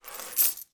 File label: money coins many scoop into hand from table